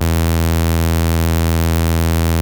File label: buzz bass, yamaha rm1x synth